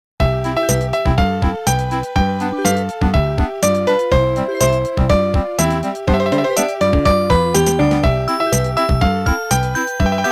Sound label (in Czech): melodie